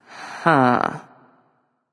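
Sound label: confused, female; smite; voicepack; hera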